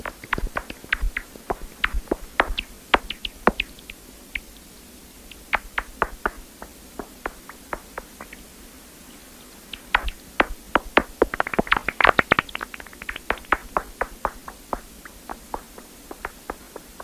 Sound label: bat